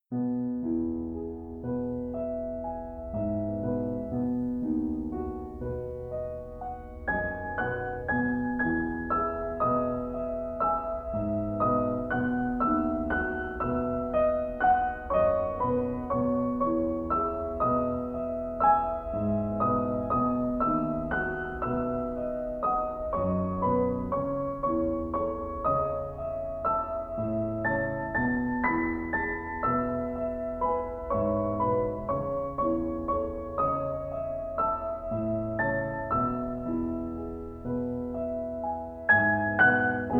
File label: Calming Piano